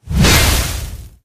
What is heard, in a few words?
warrior slash; Minecraft; Votive 1.21.1; Votive Content